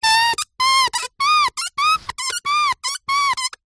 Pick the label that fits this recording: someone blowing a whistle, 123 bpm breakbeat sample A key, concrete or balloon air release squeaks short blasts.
balloon air release squeaks short blasts